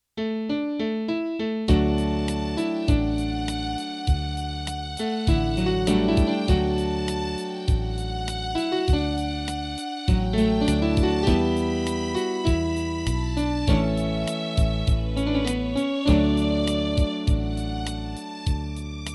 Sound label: midi